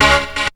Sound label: DOUBL HIT, STAB; SoundFactory; SMPMANIA